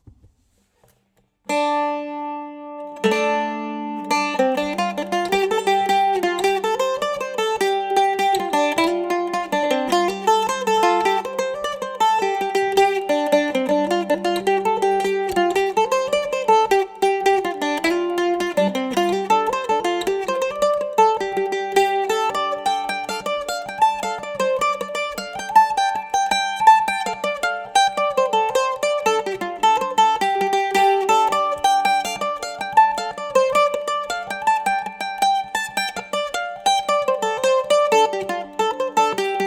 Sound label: sunnyhills, mandolin